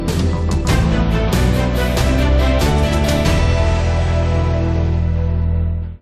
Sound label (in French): jingle fin flash info locale; Infos-locales